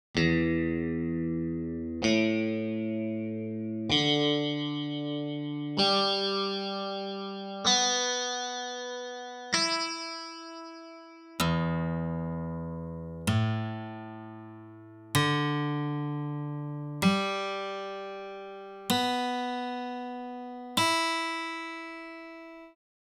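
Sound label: Guitar open strings; Kaihougen; 耳コピが基礎からできるようになる本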